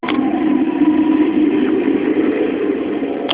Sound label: wind, ambience; dod